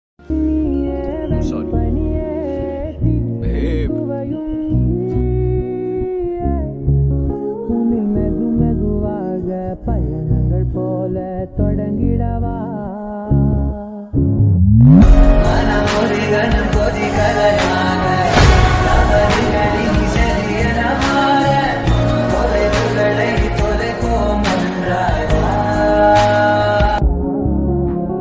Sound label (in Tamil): love tamil song; adithya varma